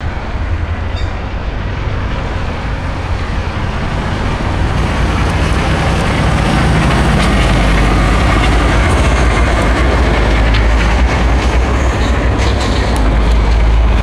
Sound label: heavy construction vehicle passing by; vehicles